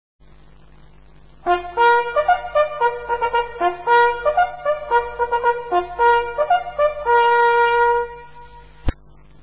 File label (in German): Leitsignal - Aufmunterung zur Jagd, Jagdhornblasen; Signale; Brauchtum